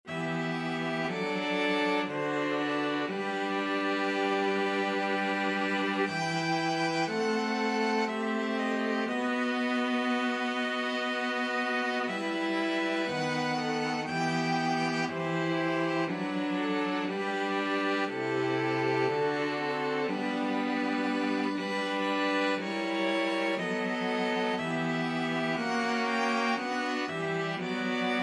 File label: string quartet; bach come sweet death